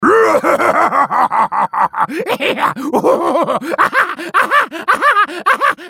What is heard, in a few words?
hyena laugh; Warwick